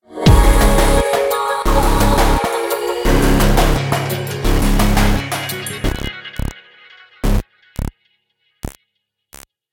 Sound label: Fight Low Combo; Remember Me